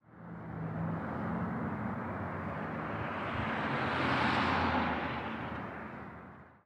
truck drive by; ambient; machines; Counter-Strike Global Offensive

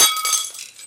cup drop, materials; Garry's Mod; hl2

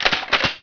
infantry reload; kindjal; Emperor - Battle for Dune

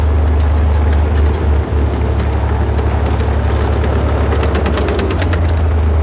tank engine light loop; Hearts of Iron IV Death or Dishonor

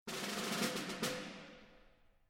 summon champion; League of Legends; lol air client

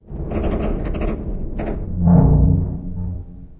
rusty pipes; materials; Garry's Mod; hl2

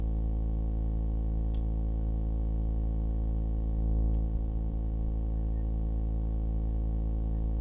hum; AssaultCube

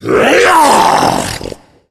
tank attack; voice; Left 4 Dead 2

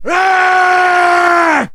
man short yell; Mount&Blade Warband

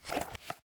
pull arrow; Mount&Blade Warband

